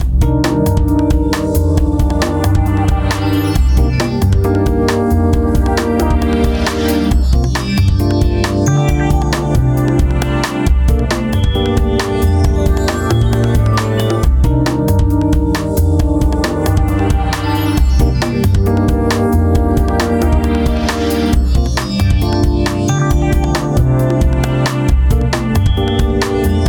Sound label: Loop Ambient